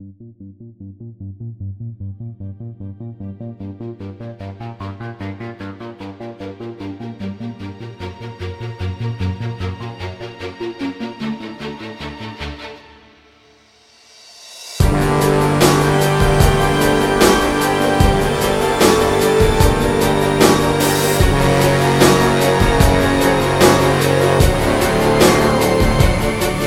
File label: Dark Roads Rock Drums